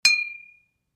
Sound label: Bottles Clinking; eating & drinking